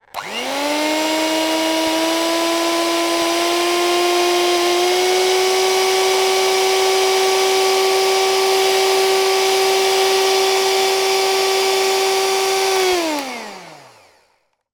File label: household food mixer hand electric on run increasing speed off; zapsplat